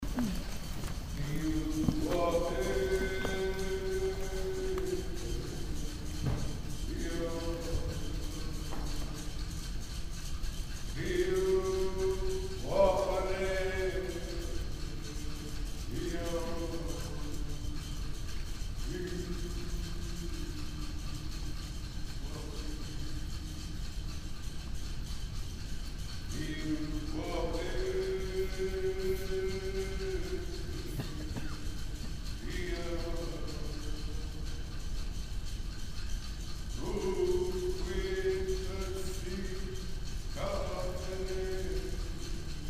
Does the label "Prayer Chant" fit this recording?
Yes